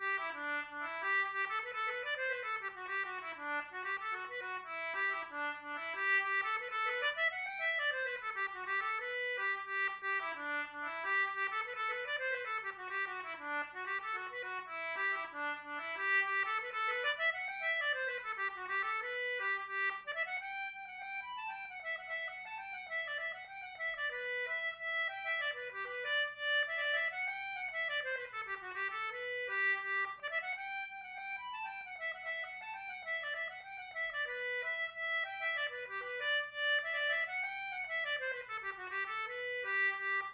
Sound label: Celtic Button Box; reel